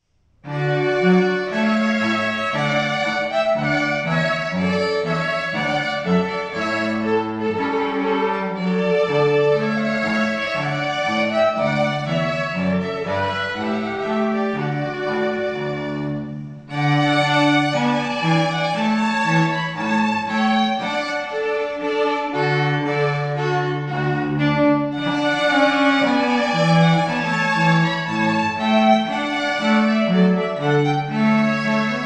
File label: strings